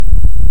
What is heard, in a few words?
Hydrophone; OneOcean